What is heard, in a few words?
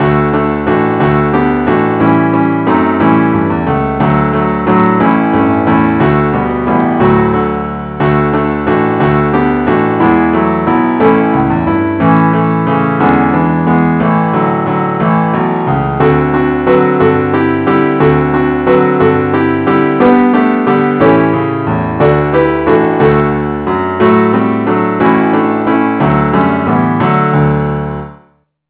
keyboard only, key D